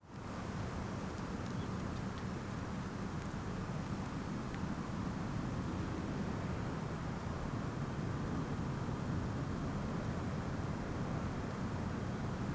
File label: ambient; cstrike; azraq